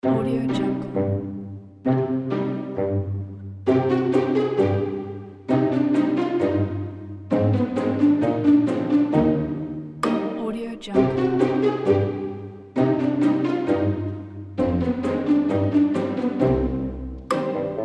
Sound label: a sneaky comical loop